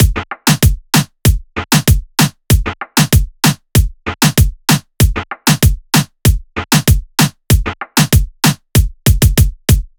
Drum Loop - 96 BPM (Latin); Cymatics; PARADOX II; Drum Loop Collection

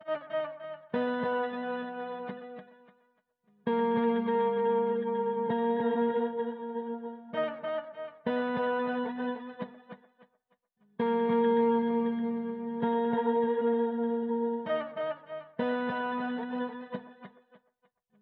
131 BPM D# Min Guitar; Melody; Loop Stems; Cymatics; 2022 Melody Collection Platinum Expansion; Murderer

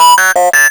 tone

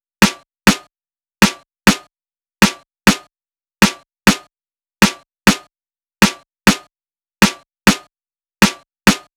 Drum Loop - 100 BPM Snare, Latin; Loop Stems; Cymatics; PARADOX II - Drum Loop Collection; Flame

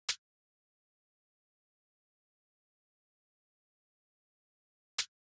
Drum Loop 98 BPM Percussion; Loop Stems; Cymatics; Vibes II Drum Loop Collection

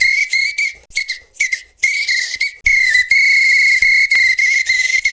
whistle rhythmic; Cartoon - film effects

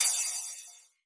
Cymbal; Mill; Cymatics; Drum One Shots; Cymbals

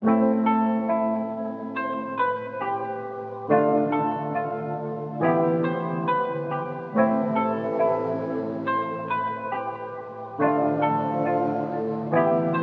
Dark Melody Loop - 139 BPM A Min; Cymatics; 2020 Melody Collection

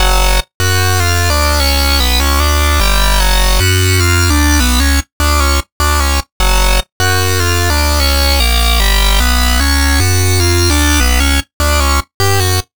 Lead Loop F# Evil, Dubstep, 150bpm; Ghosthack; Dubstep Sound Arsenal

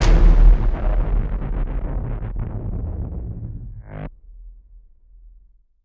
Impact; Hits

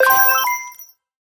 Collectibles Classic FX; Cymatics; Video Game Sample Pack Vol 1

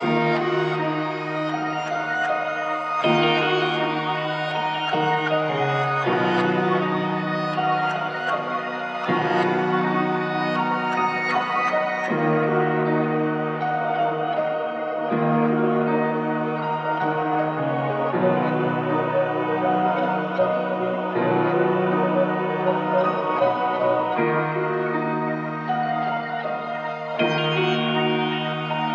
159 BPM D# Min, Melody Loops Trap; Triumph

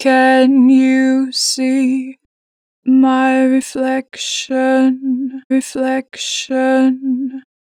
Natural Vibrato, Vocals, My reflection, 4 bar vib; Harmor Vocals

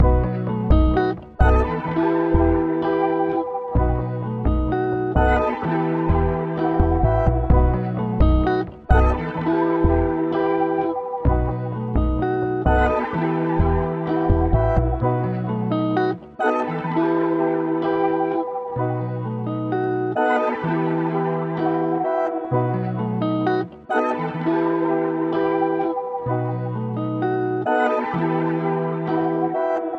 128 BPM E Min; Cymatics; Zodiac III Beta Pack; Zodiac III Production Suite

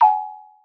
Vintage Percussion, Ring; Cymatics; Mystery Pack Vol 4

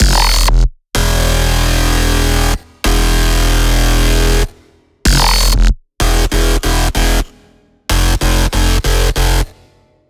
Bass Loop Em 95BPM; Dubstep; Violence; Ghosthack; Advent Calendar 2022

